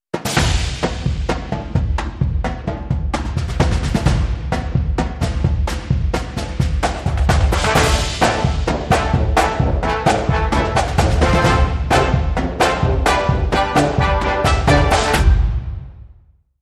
Military Tattoo, Sting

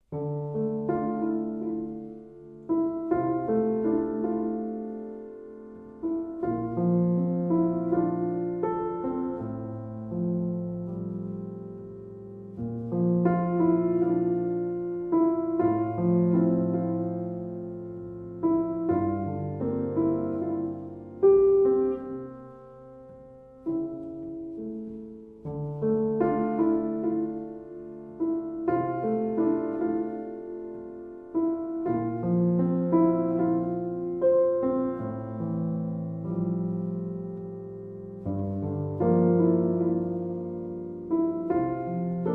Solo Piano

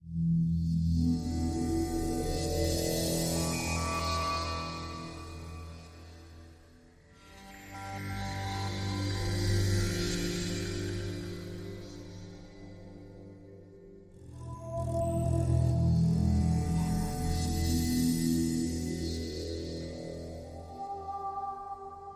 Drone, Light

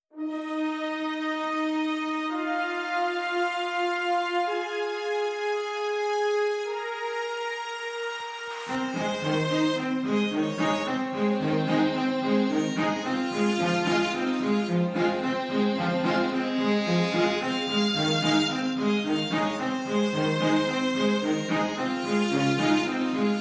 Strings Mix

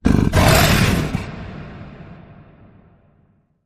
Dark Animal Roar

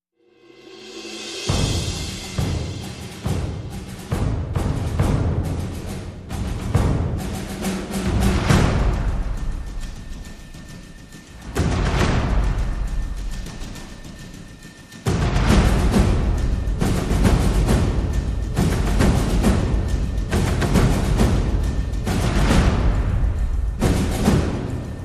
Percussion Only

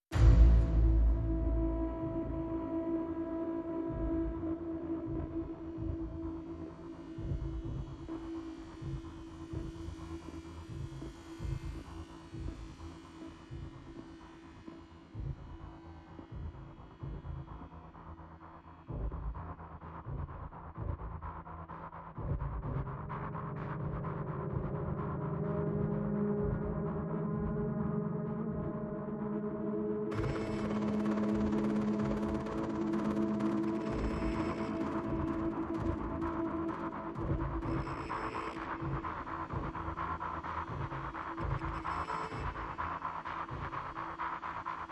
SFX and Pulses

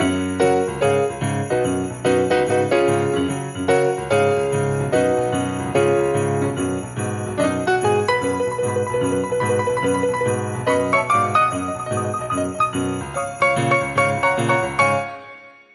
Piano Sleigh Bells

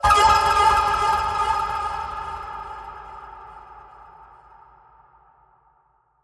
Cosmic Chirp Call

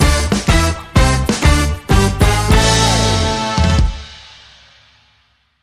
Funk

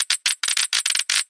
Harsh Rattle Chatter

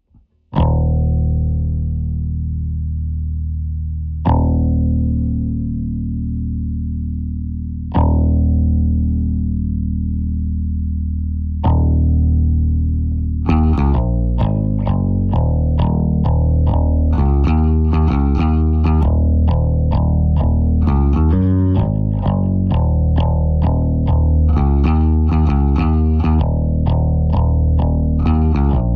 Bass

